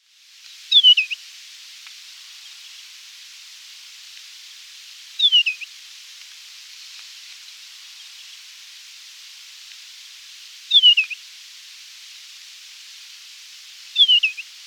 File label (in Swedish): ökenstenskvätta